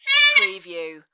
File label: Sax Laugh Quick